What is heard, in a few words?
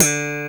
BRITE BS D4 POP, FINGER BS